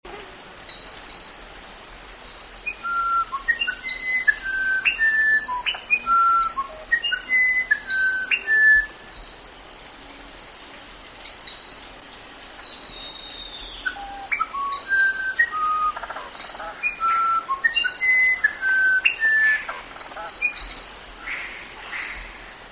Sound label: Wren; Sjoerd Mayer; Musician Wren